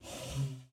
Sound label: Chair Ring Start; Game rip; MiSide